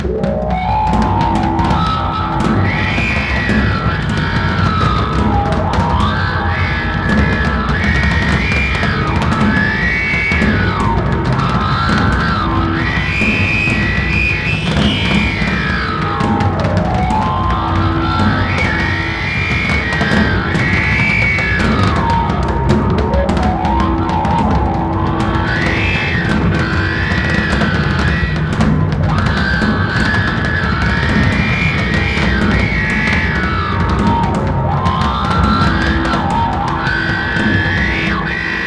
pistol drums

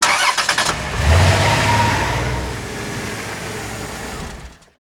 van police; Counter Strike Model Pack; CS:GO; vehicles insertion

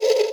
move, hinges drawers; tictac

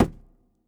phys cube md; Portal 2

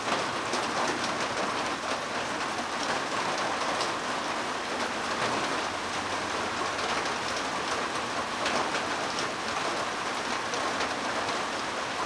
rain interior tin roof; Counter Strike Model Pack; CS:GO

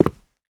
rubber, player footsteps

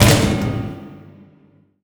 vent crash open, grate; Half Life Alyx

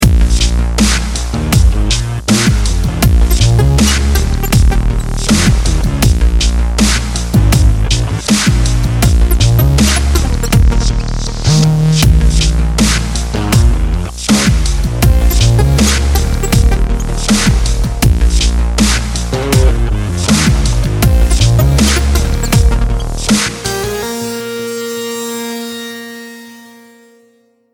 start round, music

